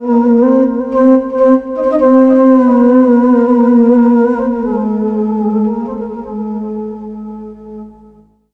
SYNTH, ARABIC

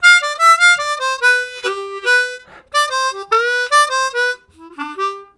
Breath, Harmonica; Zero G

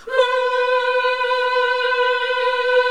HMM C3; Best Service Gigapack 1

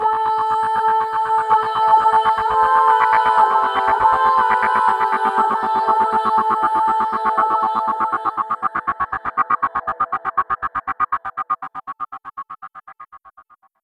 Gate Compression; Sonic Foundry (Sony Creative Software); Pocket Diva World Class Vocal Samples

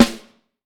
CHB, Vintage Snare Drum; Q Up Arts; Johnny.C's Vintage Snare Drum; 5.5X14 SLR

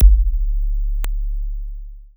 kik, one shots, dance; Sonic Foundry (Sony Creative Software) - Future Retro Dance Excursions

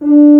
Tuba mf D3; Digital Sound Factory Virtuoso

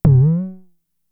FX, Drum Machines; Fricke Schlagzwerg

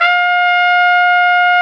Solo Brass, Trumpet; Trumpet 5-7; Tp 5 RCA Jaz

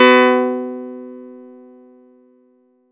Chord; ModelCycles

